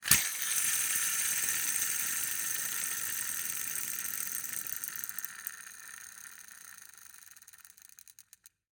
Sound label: Rollerskates, old fashioned, all metal, wooden wheels, swipe, spinning, slow to stop, aggressive; Eiravaein Works; Vaeyan IV